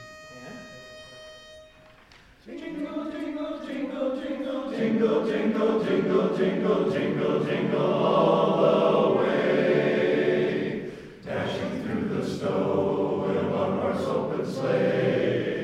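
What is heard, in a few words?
Jingle Bells, Chorus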